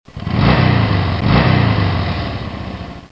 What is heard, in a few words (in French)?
Moto démarrage